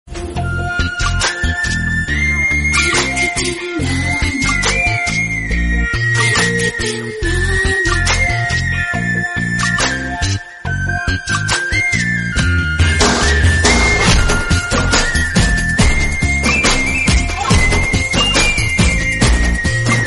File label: instrumental